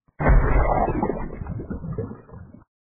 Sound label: splat; vapesquared